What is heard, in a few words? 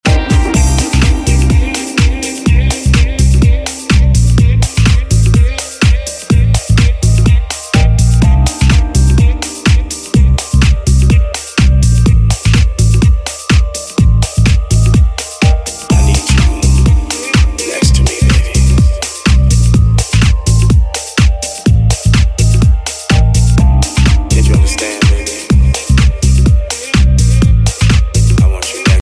rock english music tone ringtone